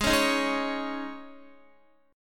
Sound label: Ab Suspended 4th, Guitar; Absus4 strummed; x,11,11,8,8,8; JGuitar